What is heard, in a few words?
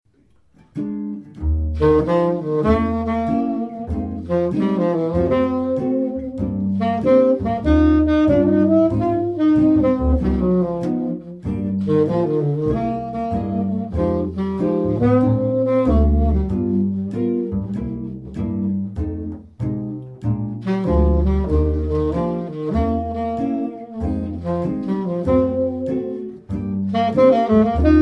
Jazz Trio; Netherlands Jazz Trio